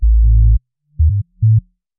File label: bass